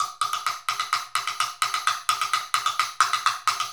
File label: Wood Block; Sonic Foundry (Sony Creative Software) - Essential Percussion